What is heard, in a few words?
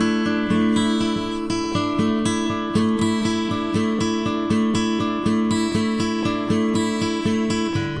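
acoustic guitar picking, 120 bpm; GUITAR LOOPS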